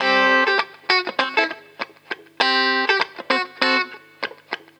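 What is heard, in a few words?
guitar, Roots Funk; USB Soundscan vol.41; AKAI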